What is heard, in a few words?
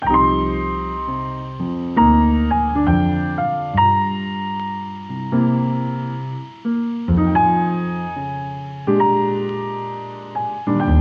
Slow jazz